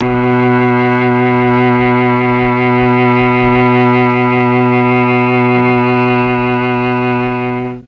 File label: B2 mellotron cello